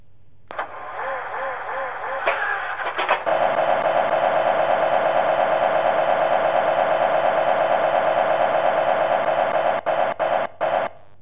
light engine ge25

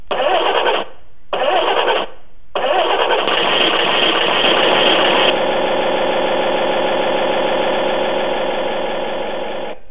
rm engine, railbus; Goose #5

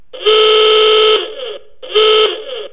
rm horn, Klaxon; Leyland

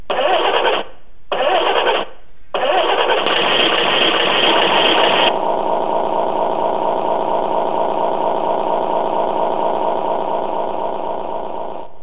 rm engine, railbus; Goose #6

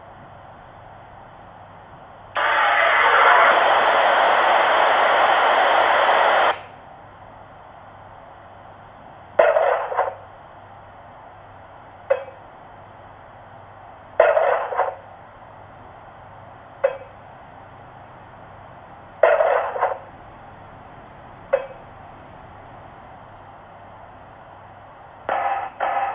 steam sounds

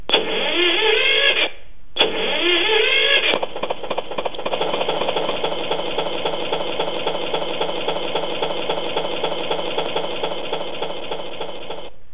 rm engine, railbus; Simplex

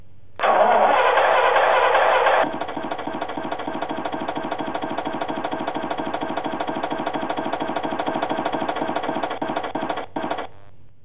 light engine big simplex

